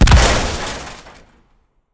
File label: Explo Damage State Heavy; AI Reaper; Titanfall 2